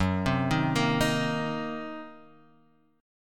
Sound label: Gb 6th Flat 5th Gb down Guitar Standard; arpeggiated; Gb6b5; JGuitar